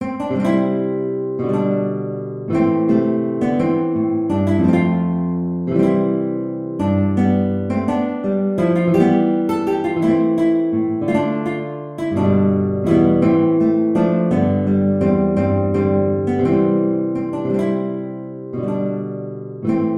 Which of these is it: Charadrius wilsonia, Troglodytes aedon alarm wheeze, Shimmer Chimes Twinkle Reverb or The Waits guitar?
The Waits guitar